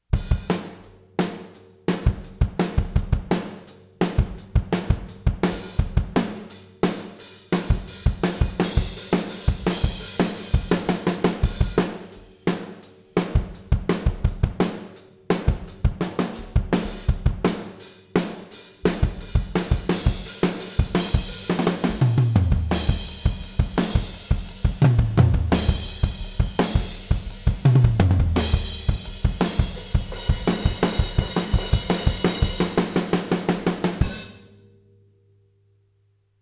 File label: drums